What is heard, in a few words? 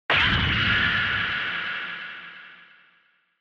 Retro Anime Robot Low Long